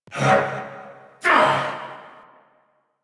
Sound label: Grunt; Nightwave; Season Three Glassmaker; Nihil Fight